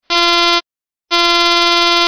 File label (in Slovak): fanfara 1 tonova 12v s kompresorom; fiamm m4 nr